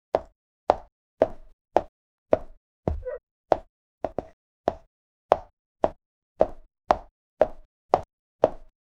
clean isolated sound of a, clip clop

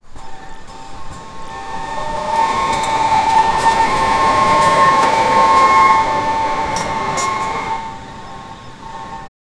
the sound of the claws, claw

